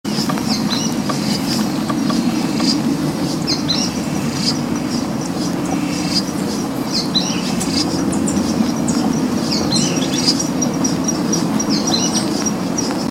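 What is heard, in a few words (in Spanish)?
pijui f gris, Synallaxis frontalis - Pijuí frente gris - Sooty-fronted S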